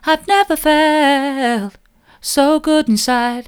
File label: never felt so good inside soft, vocal